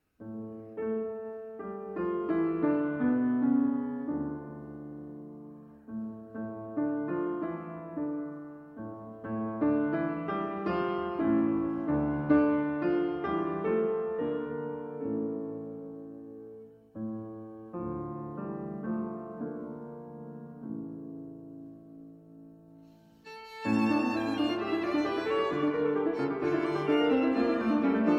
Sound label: violin sonata; labor